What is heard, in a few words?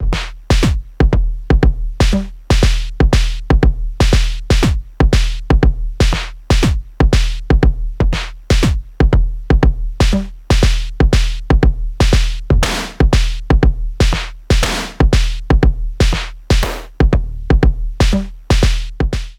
Transient Plus Sample Select Modulation; Future Retro